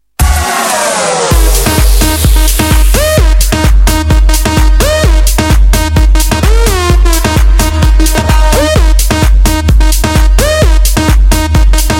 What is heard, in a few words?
House